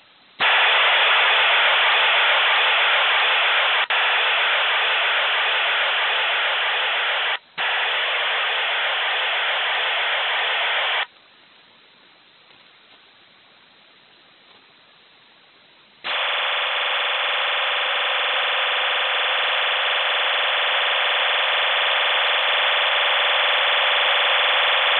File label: Russian 3000 Bd serial tone waveform sending short bursts; Russian 3000 Bd serial tone waveform setting up link for 128-tone OFDM transmission; 8PSK